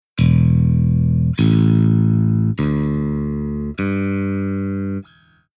Bass Tuning Regular

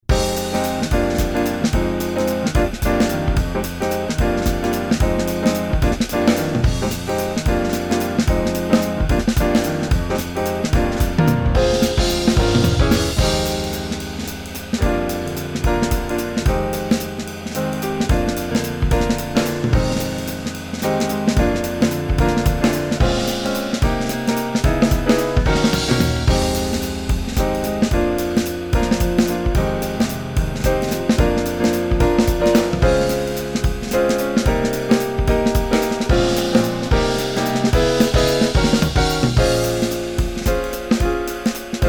Jazz Waltz Chord

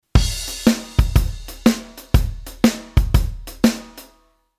Midi Basic Quantize

